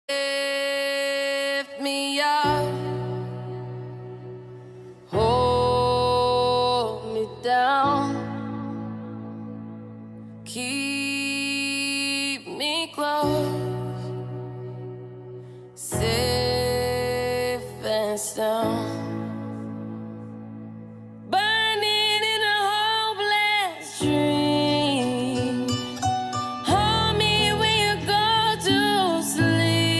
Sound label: english ringtone